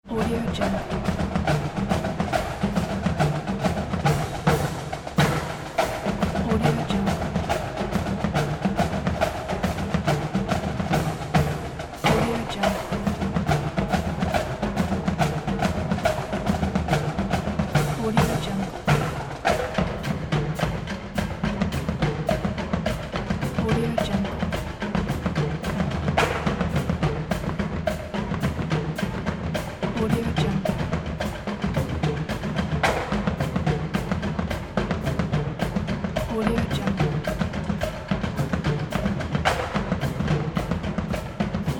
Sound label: marching band parade; human sounds; مارش نظامی رژه